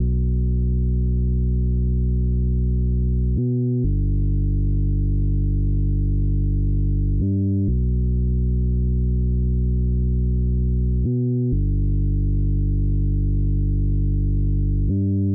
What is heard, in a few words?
Nostalgic Melody Loop - 125 BPM B Min Bass; Melodies; Loop Stems; Cymatics; Oracle Sample Pack